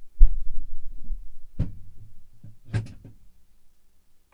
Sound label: closing emergency avionics door in front of landing gear, A320 - 321 Aircraft